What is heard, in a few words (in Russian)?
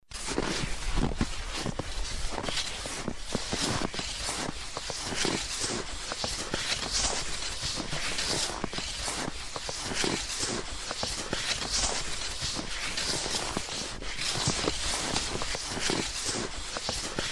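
Идут на лыжах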